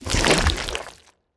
death reverse

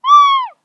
flute

